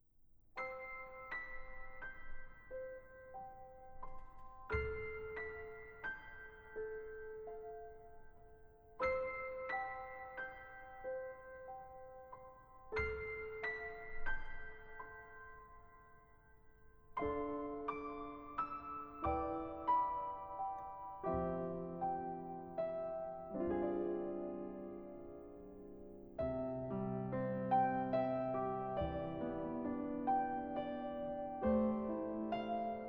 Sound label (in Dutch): piano improvisatie; Kerst